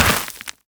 land ground

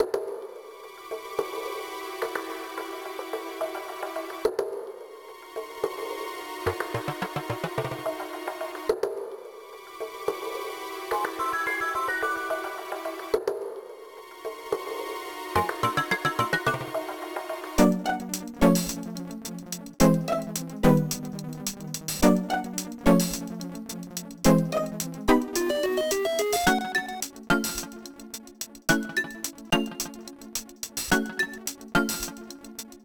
BGM Theme Suspense; Mister Slime

